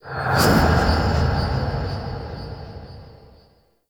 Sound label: UI Customization Menu Open